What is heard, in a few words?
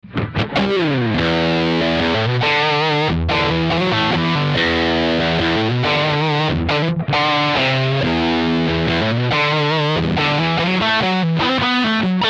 wayhuge green rhino overdrive mkv